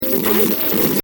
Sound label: Static Blast Data Transmission Radio Tuning; Sound Design; BBFX